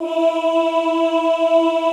OOH E3, Classical Choir; Best Service; Extended Classical Choir

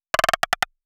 155 Perc Fill

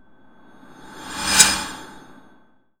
METAL SIZZL; ENVIRN-AMB

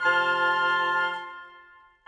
Winds, Orchestral; Sonic Foundry (Sony Creative Software); Orchestral VOL-2; Modern 31

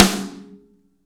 SN AMBIENT, Real Mega Drums

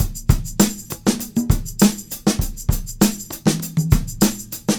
Combo, R&B Drums

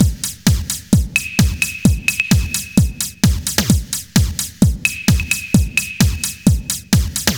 Beat Rapid; Zero-G; Future Wave; Beats

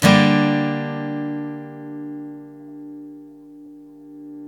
GTR D35, Chords; Club-50 - Foundations Roland

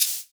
Shaker; Equipped Music; Loungin House

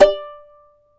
charango D4; Spectrasonics; Hans Zimmer Guitars VOL-2

